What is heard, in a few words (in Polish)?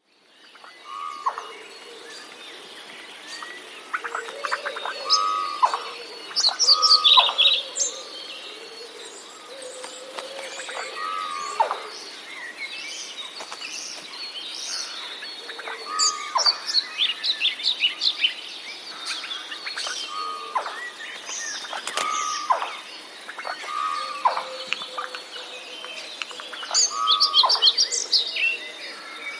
sojka